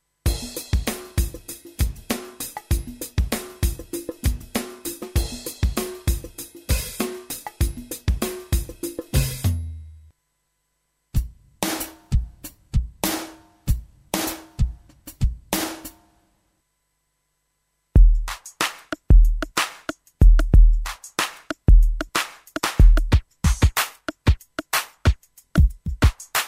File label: solo drums perc fx